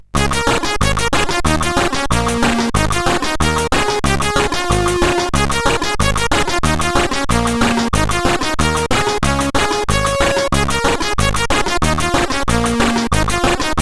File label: Ragtime, sid CSG8580R5; Bjerregaard Johannes; Ragtime Anno 87